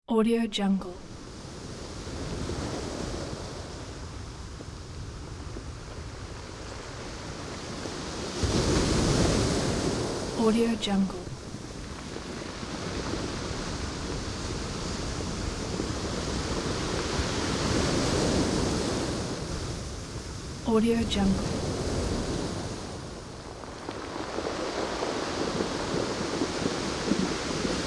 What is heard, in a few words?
tranquil ocean